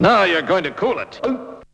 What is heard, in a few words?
VOX; Zero G; Man Machine